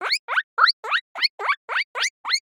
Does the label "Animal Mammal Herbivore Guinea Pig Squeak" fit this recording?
Yes